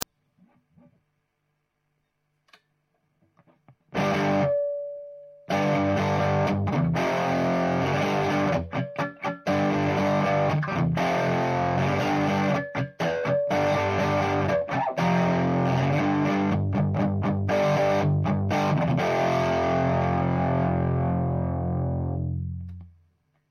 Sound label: usa tele dist; orange tubefactor; tele pu test